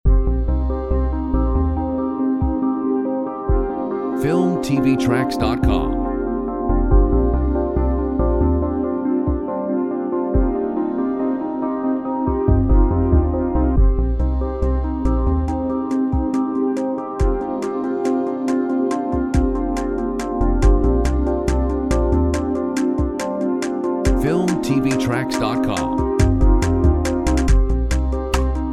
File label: Refelective Ambience; ambient; Filmtv-tracks